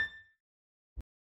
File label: Stem Beep; Portal 2